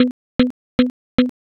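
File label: footsteps flooring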